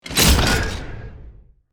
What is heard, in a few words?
Horror survival game menu ui unlock item